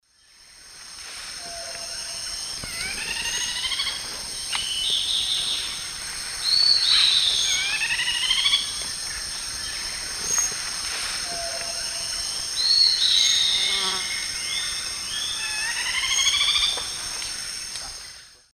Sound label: Piaya minuta, call; S. America; Eastern Lowlands